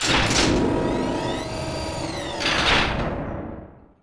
door blast open

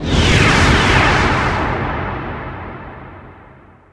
fire on

